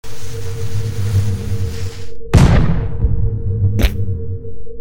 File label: Cannon; Gravity Pit